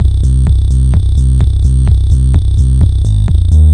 128 bpm distorted eurotrash disco, drum loops and breaks